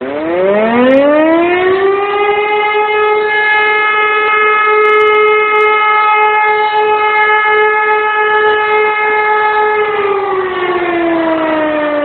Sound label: sirene alarm